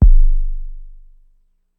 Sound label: Kick, Ensoniq ASR-X Pro